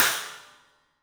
CYMBALS; 3D Sound; 3D Drums

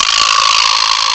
VIBSLAP; CMI Sound Library Rev 1.3

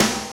DANCE SET, DRUMS

